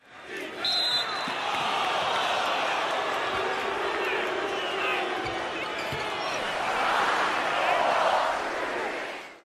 Basketball crowd cheering at a game

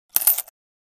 Four small coins drop on solid surface

